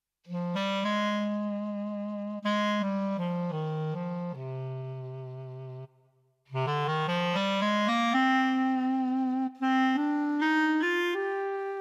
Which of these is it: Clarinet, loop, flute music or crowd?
Clarinet